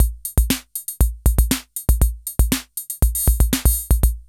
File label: DISCO, KR55; INIS; Funky Xtreams